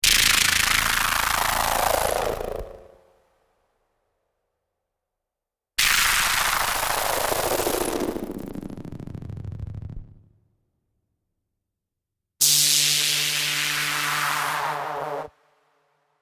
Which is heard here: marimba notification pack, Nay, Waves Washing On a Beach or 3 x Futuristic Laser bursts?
3 x Futuristic Laser bursts